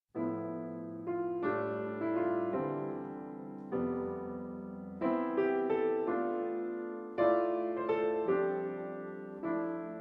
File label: Piano Prelude; Piano Prelude 6